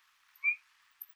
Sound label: Chirp